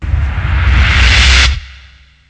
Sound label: megawhoosh; Weird and Interesting Hits; single hits